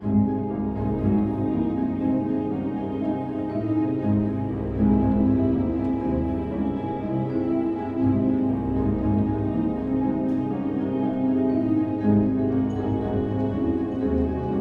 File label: High Energy, film score; Glimpse film score